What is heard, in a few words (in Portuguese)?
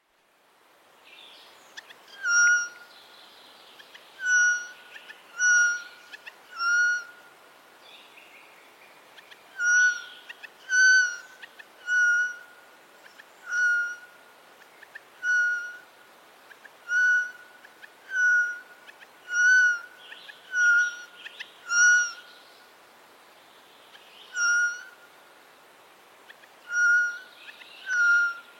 Canto do gaio